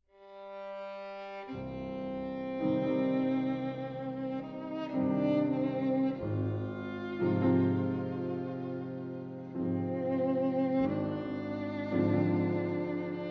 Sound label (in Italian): andante sostenuto; Kahn; op33